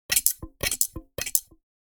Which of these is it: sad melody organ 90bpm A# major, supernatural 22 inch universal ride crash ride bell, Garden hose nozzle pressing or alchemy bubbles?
Garden hose nozzle pressing